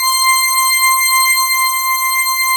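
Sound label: pipe C5, organ; EGLISEORG; POPPIPE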